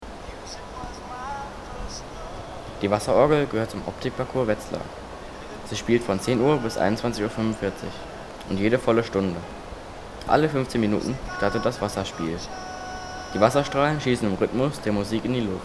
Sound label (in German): Wasserorgel; hessen hoeren; gis wetzlar